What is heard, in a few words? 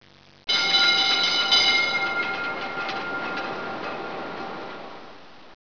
bell